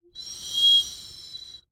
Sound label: Bird whistle, clay, painted, dry, airy, wispy, swell, smooth, light; Eiravaein Works; Vaeyan IV